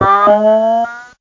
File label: talk